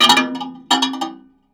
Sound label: Raw, contact mic, Tin Of Guitar Strings; musicradar; contact mic madness